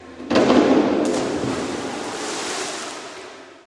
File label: Swimming Diving Off B, diving